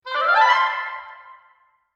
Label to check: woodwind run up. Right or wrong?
Right